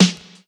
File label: nice snare f sharp key